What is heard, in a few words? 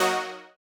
Housey Stab F#3; KG MegaSaur